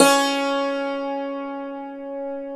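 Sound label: dulcimer C#2; Proteus3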